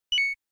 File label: Arcade Action Score